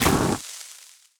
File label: Fire Enemy Footstep; AudioWizards